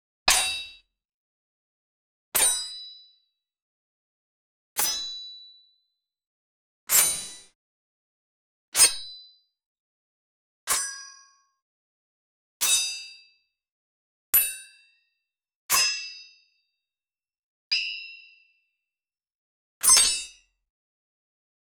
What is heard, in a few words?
realistic sword combo, martial arts